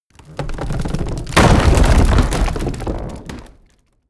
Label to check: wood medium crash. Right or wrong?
Right